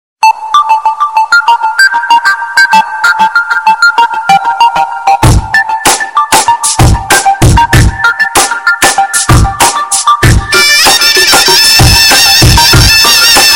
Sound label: arabic tone